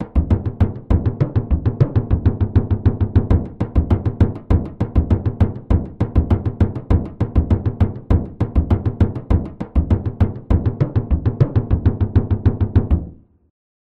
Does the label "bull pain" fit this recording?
No